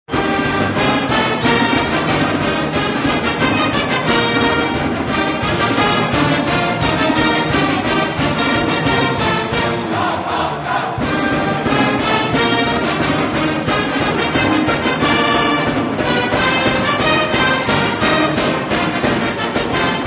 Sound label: Victory March Pep Band